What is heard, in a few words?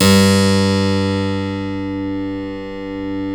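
G 1 clavinet, Keyboards Acoustic; USB Soundscan vol.17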